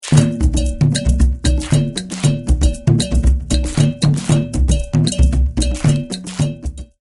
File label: tribal rhythm